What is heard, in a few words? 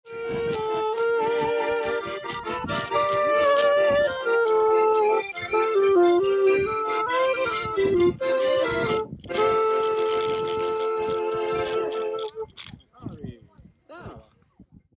Accordian audio; Jaybird Run Wild Chamonix Feature